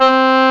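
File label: woodwind C1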